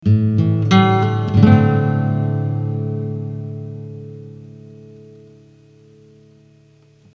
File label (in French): guit classique